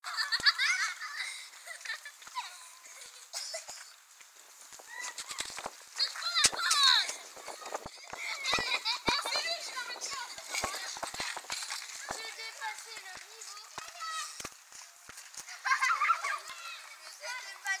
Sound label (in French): ambiance de cours de recre, Poitiers quartier Saint Eloi